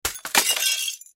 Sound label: Crash Glass Smash Crash Object; Smashes (Sound Effects:Objects); Blastwave